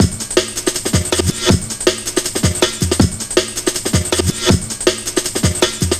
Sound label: 160, drum loops, drum & bass; Total Drum&Bass